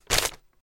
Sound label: Paper Grab Quicker